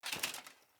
Grunt Foley Melee Swing Armour; Enemy; Sinecure

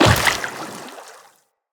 Step Water, Footsteps, Walk; Tenno

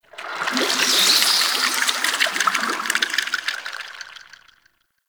Squelch Goop One Off; Warframe; Tau; Ambience

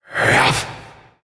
Possessed Red Veil Vocal Attack; Warframe; PriestQuest

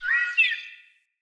Ext Close Bird; Midrath Island

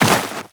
Walk Snow, Footsteps; Warframe; Tenno

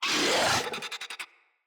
Infested Charger Attack, Enemies

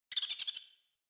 Rat Chatter; Ambient; Vocals; Verminia Messenger Rat Chatter E; Animals

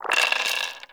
Roll Dice; Cetus Vendors; Five Fates

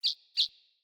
Bird Double Chirp; Pothia Bird Guide

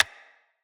Claw Clack; Enemy; Combat; Characters; Corrupted Sproutfolk

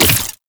Sentient Rifle Mech; Warframe; New War

